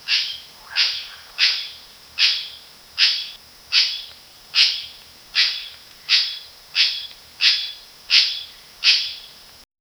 generate a monkey swingin, jungle

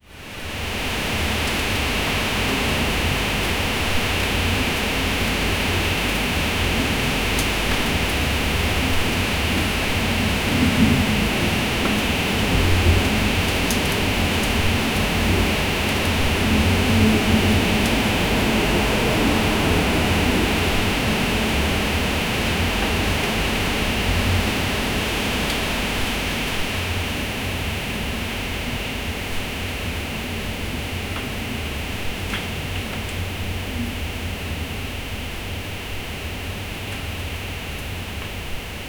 inside a cozy timber bedr, wooden deck

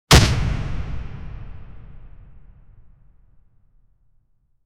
sniper rifle; gunshots

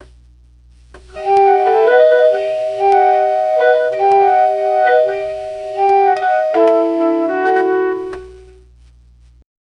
banjo music fading in and

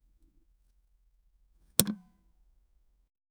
the microphone is inserte; audio equipment

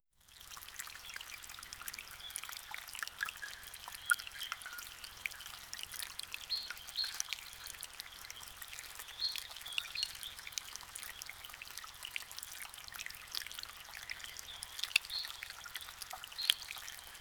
soft and gentle, bird chirps

